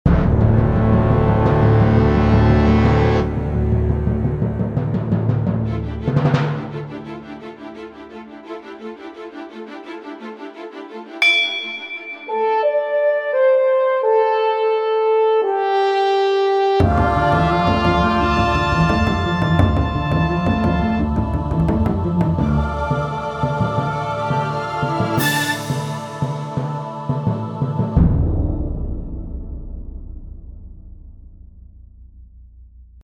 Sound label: Action Music